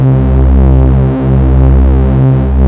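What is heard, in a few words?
MOOG MODULAR C2; Hollywood Edge - Giorgio Moroder Rare Synthesizer Collection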